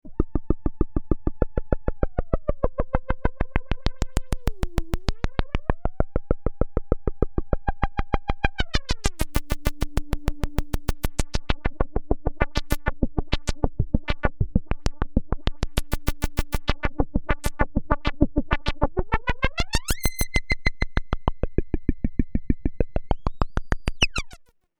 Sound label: Dark Energy FX Perc